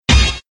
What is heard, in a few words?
coin; StepMania 5; Dance Dance Revolution 6th mix -DDR MAX